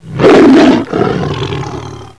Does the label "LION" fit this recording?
Yes